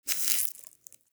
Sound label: Wheeze Close, Infested; Ambience; Warframe; Infested Eris